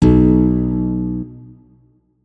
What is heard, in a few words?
notes, guitar; Hideout Furniture